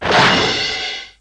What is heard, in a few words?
vintage whack thud, Cartoon Sound Effects; UNUSUAL KITS and FX